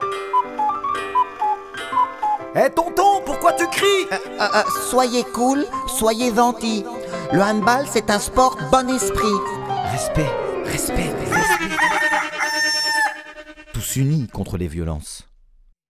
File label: jingle; grande journee de la citoyennete du comite 57 hb